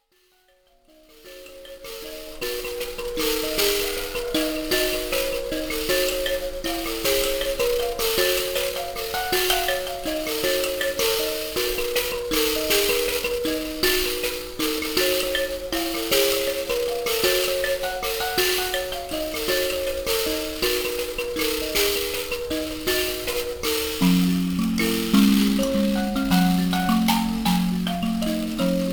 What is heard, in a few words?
mbira